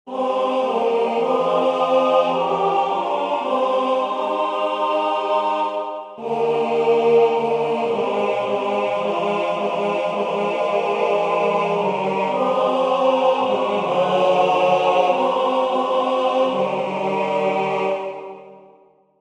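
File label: Meekness tenor, wisdomchant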